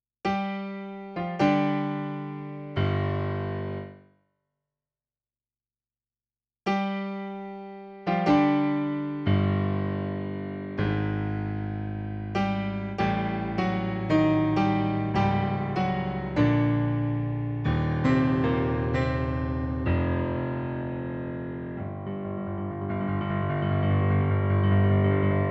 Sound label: Piano